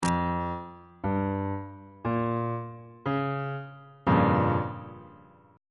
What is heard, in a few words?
b flat minor 6th 2nd inversion on bass clef